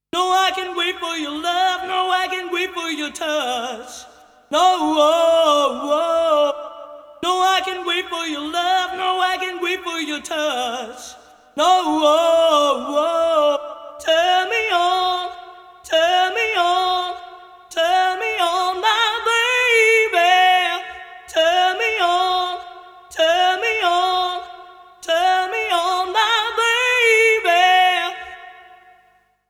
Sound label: acappella